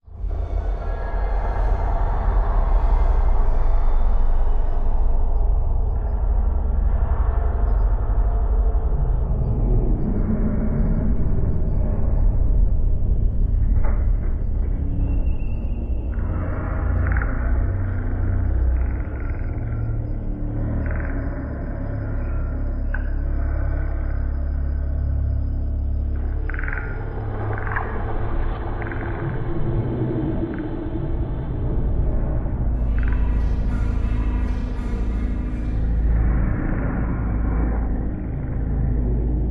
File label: Stirring The Undead Ambience